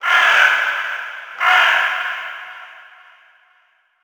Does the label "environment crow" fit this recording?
Yes